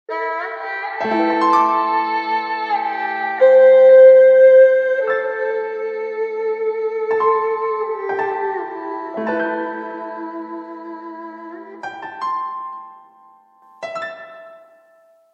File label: Erhu